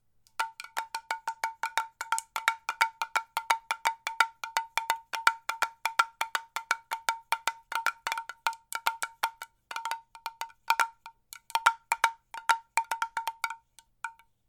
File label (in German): drehtrommel mini